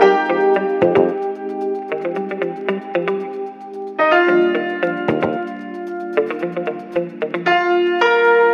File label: piano and guitar, Phonky loops